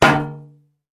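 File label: cartoon hit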